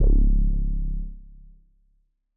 M Elec Bass C#1; KG MegaSaur

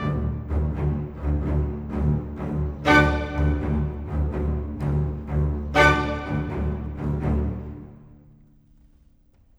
Cinematic Orchestra

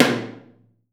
TOM R, DRUMKIT

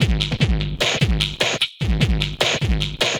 Beat, Futurist Drum & Bass; Drums & Percussion

